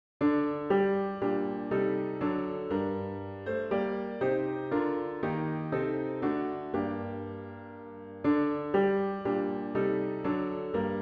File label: The Days Are Surely Coming piano with Intro